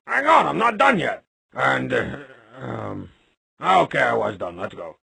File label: death; quake2; strongbad